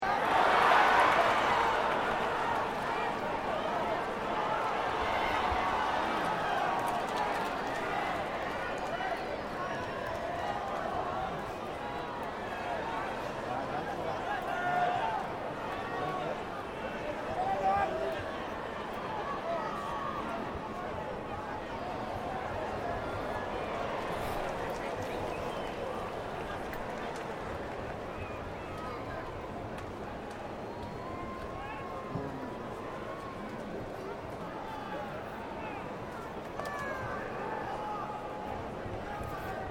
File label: AMBIENCE WORLDCUP RUGBY CROWD; World cup rugby match between New Zealand and Papua New Guinea; Loop Stereo; Sports